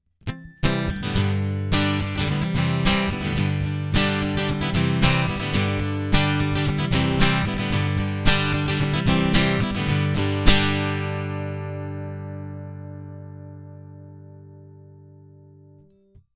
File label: guitar